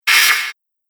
Sharp Whoosh; sound design

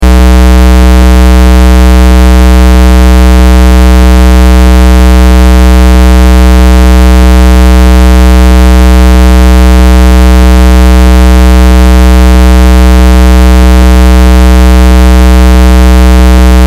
Test Tone Square; Wave 62.5Hz 60 Secs 0Db Loop

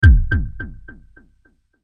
Hit Low Pulse Delay; Bass Button; Production Element Imaging Element Accent Transition; Blastwave

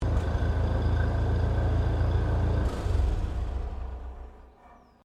Tractor Furguson 1956 shutdown, Old Farm Tractor; Tractors; Vehicles

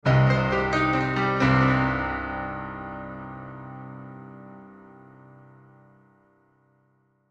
Piano Accent, Piano Delay Accent Melody Sad; Musical; Blastwave

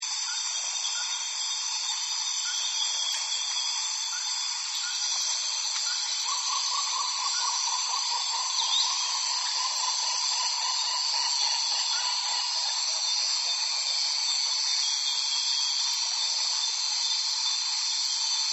Jungle Dawn Chorus, Nature Scenes; Sound Effects:Backgrounds; Forests and Jungles

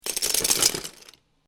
Lego Drop Several Pieces Into Lego Box; Miscellaneous Toy Sound Effects

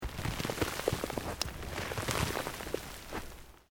EARTH LOOSE MOVE LONG, Debris

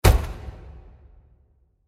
Short Impact With Reverb; Blasts (Sound Effects:Impacts)

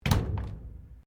Clothes Dryer Door Close, Domestic dryer; Laundry Sound Effects; Laundry (Sound Effects:Household)

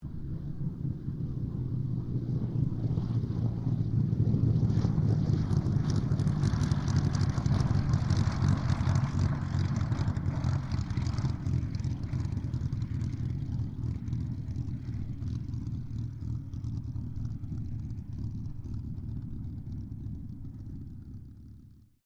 AIRCRAFT SUPERMARINE SPITFIRE MK-XVI LAND; Airplanes (Sound Effects:Vehicles)

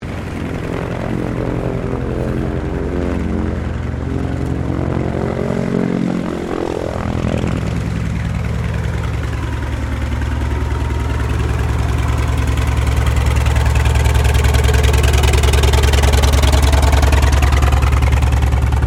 AIRCRAFT T-28 TROJAN TAXI, fighter bomber; Airplane; Airplanes; Vehicles

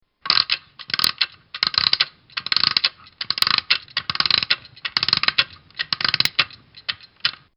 Alarm Clock Winding; Alarms; Electronics

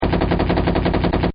MACHINE Gun; Machine Guns; Partners In Rhyme

